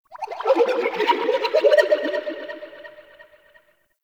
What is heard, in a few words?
Ocarina; Warframe; Ambience; Nokko Colony